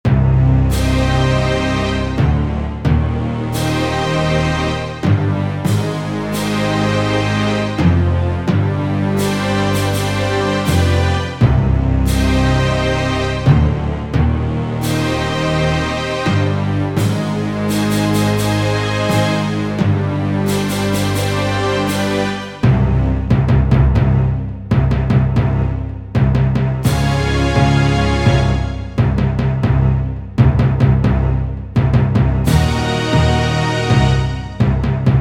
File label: Orch w-Perc